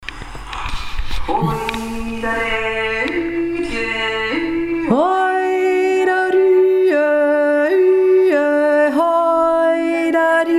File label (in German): gegenstimme